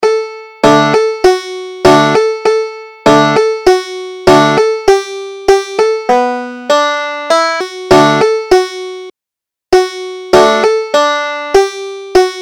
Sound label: rock the cradle joe, banjo